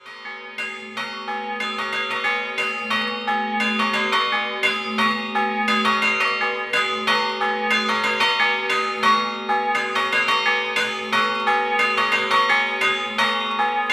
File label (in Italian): Scampanottata; San Martino; campane